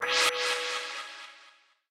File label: Key Founded; Game rip; MiSide